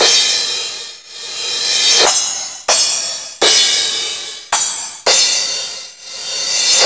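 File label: Roland Tr-909 crash cymbal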